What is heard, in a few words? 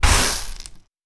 Sand gravel